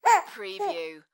Baby Laugh; sound effects bible

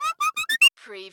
CARTOON MELODIC CHIRPS

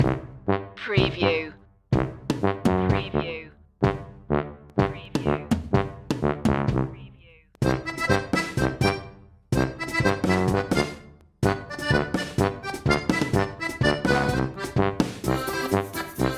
cajun gumbo loop

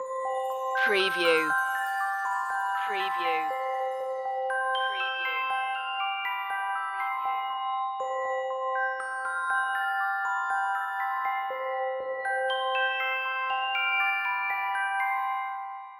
fairy dream magic loop

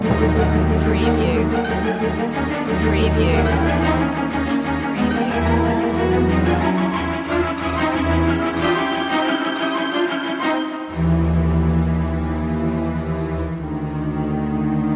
halloween loop

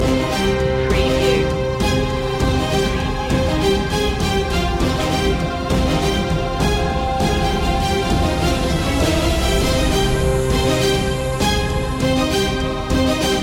epic warfare

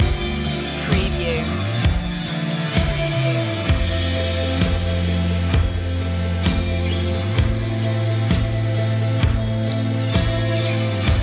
slow loop

